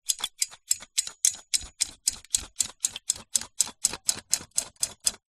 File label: industrial caulk gun pumping trigger